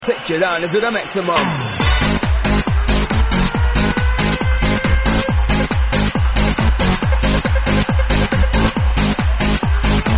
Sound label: horny horns vocal